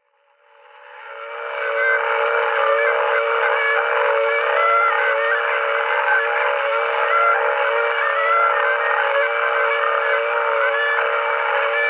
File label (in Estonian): Torupilli Jussi lugu, Emmaste, torupill, folk